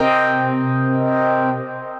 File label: Butter Puff Stabs, Synth Keys